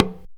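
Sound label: small tama hit; Best Service; World Colours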